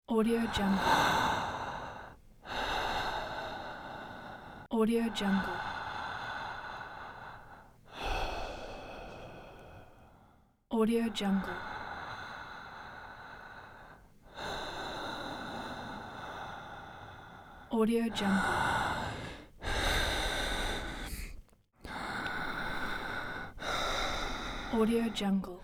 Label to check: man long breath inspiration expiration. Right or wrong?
Right